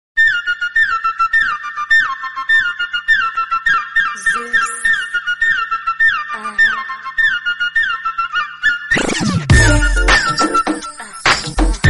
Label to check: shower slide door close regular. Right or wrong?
Wrong